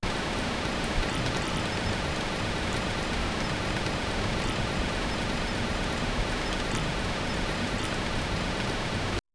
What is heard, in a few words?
rain sounds inside a cozy, frog croaks